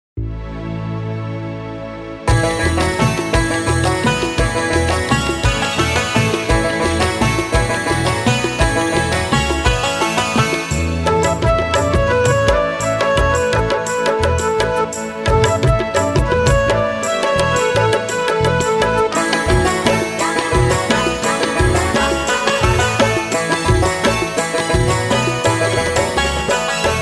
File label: Karaoke Music